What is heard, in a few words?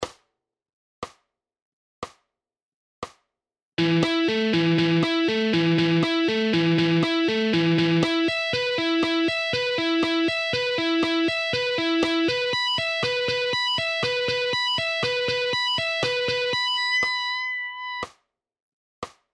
Half Speed Pentatonic Scale Guitar Licks